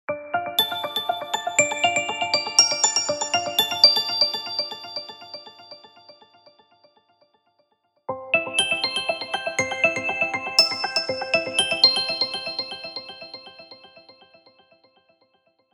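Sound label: space bell, infinix phone sounds; telefona infinix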